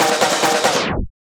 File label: Fills, Loops 140 BPM